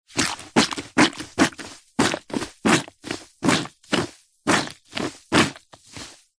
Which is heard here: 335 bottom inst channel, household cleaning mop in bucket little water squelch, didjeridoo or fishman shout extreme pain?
household cleaning mop in bucket little water squelch